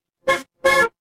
mechanical horn

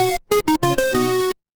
retro game game over

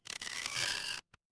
cartoon stretch

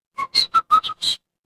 animal bird

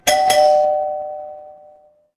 door doorbell

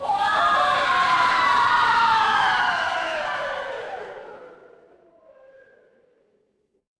crowd cheer

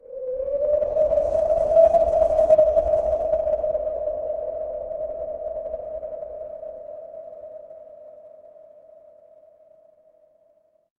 ambience wind

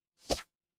ui swipe

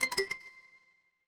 feedback start

